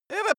cartoon male jump prepare

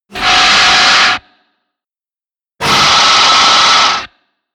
distortion

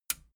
metal button radio push disable

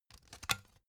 cd take out tonal

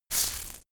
insect shaker hit single soft

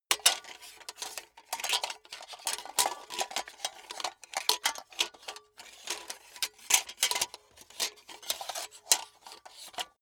metal plate dented handling

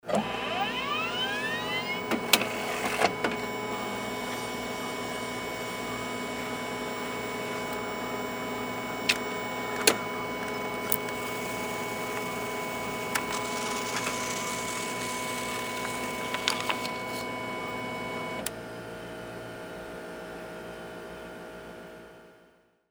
printer printing cycle

